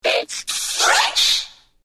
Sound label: scratch